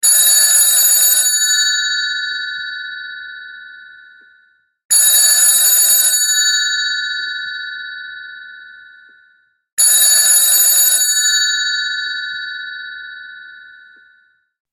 old classic telephone ringtone; android

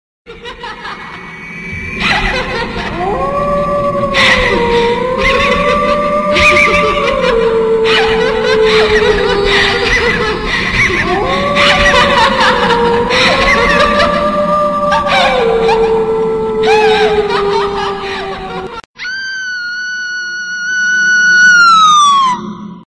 horror segment ringtone; android

